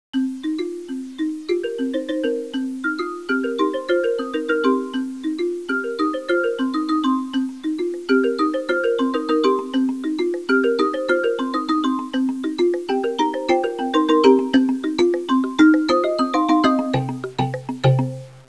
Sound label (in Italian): suonerie musica ritmica